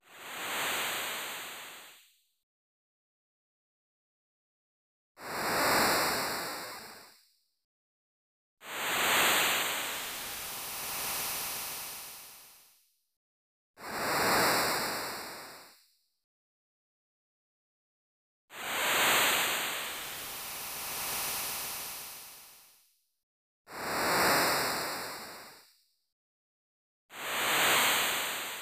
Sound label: Ocean Waves; Chrono Trigger